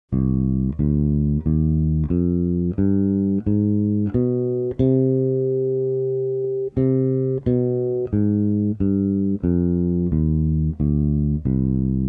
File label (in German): harmonisch moll